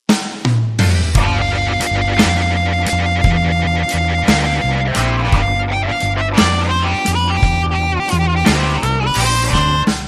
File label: Slow Harmonica Blues